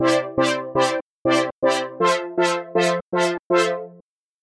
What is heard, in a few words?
synth unison; synth1